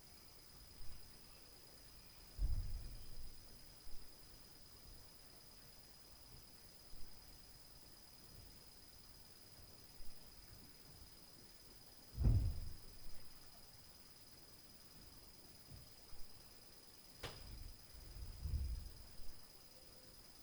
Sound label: UPSTAIR BOOM NOISE; Night Noise